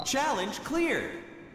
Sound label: challenge cleared